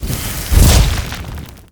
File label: Key open